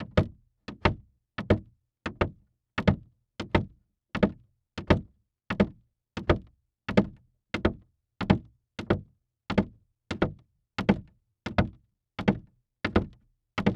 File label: Foley Footstep Hard Sole Dress Shoe Walking On Wood Platform; Foley Footsteps